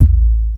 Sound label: Kick, Drums Percussion; Zero-G; Total Drum Bass